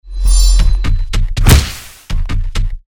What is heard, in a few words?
attack; hero